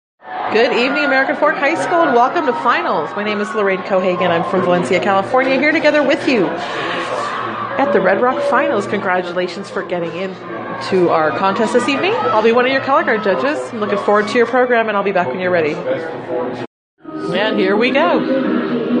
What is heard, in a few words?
marching band competition, color guard; umea red rocks marching band competition finals; american fork color guard